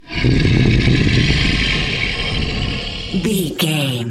troll monster growl long

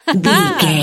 voice female expression emote

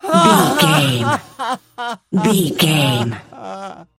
voice male cry high

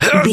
voice male effort short

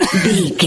voice male cough short

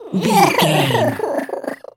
goblin fairy cry low

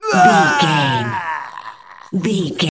voice male grunt pain death

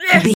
voice female attack

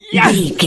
voice male attack

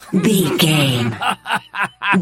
voice male laugh short

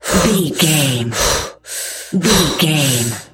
voice female breath deep loop

